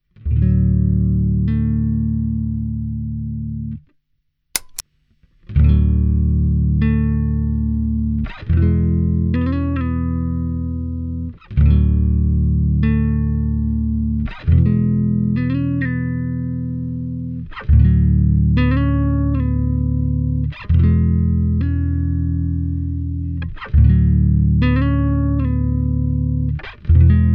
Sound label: BASS